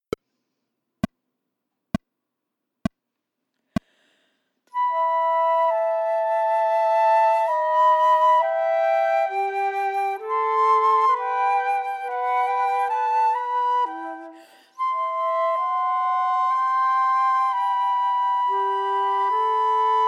Adagio, Chamber Music for 3 Flutes, minus 2nd part; Corelli